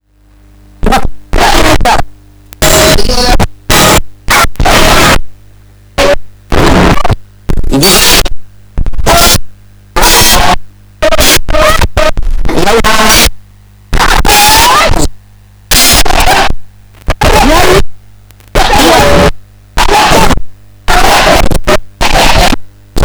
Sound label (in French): couche vinyl